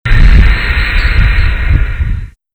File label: jetpack burst end; Shattered Horizon